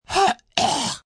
Snort High Pitch, Snorting, male/female, clearing throat (human voice); Sound Effects:Human; Voice Box